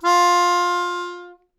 Melodica Long, Long Notes Chromatic

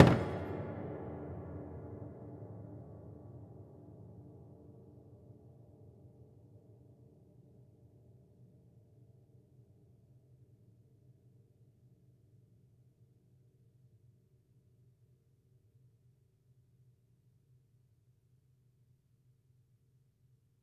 Body Thump Ped Sgl, Piano Destruction

